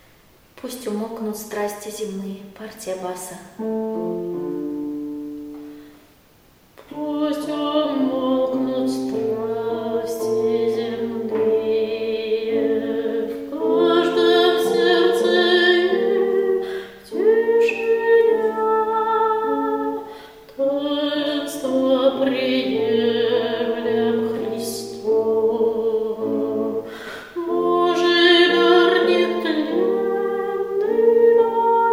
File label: bas